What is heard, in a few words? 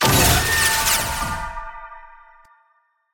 SFX Enemy Boss Soul Shackles Cast; bloom minigame